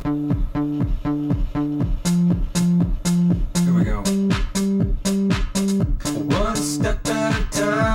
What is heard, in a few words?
reverb